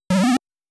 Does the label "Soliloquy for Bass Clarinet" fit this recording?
No